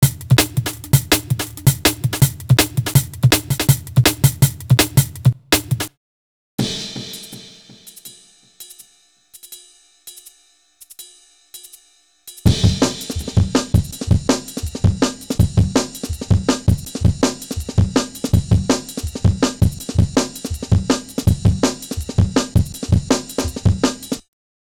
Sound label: breaks, Jungle; Zero-G Jungle Frenzy 1